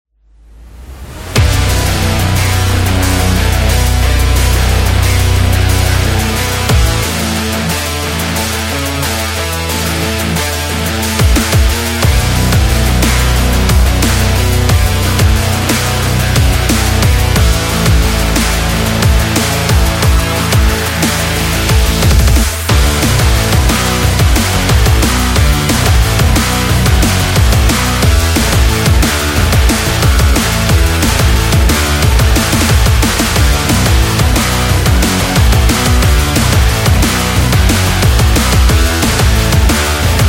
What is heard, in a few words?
All This Metal